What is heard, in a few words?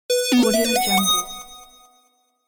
game win